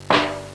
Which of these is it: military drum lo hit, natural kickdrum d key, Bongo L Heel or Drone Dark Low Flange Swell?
military drum lo hit